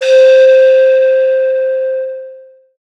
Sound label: Clay Flute C4; Winds